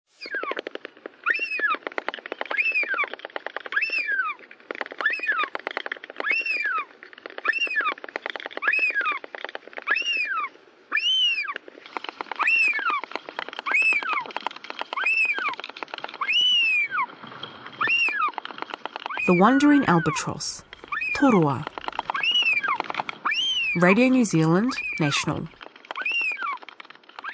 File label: birds wandering albatross